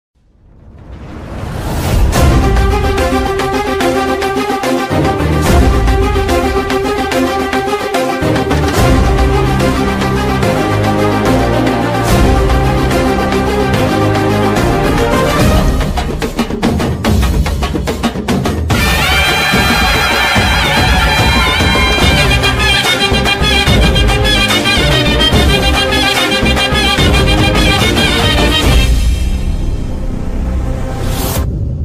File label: theme music background score bgm; sandakozhi 2